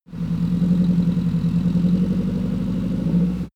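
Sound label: car vw t1 idle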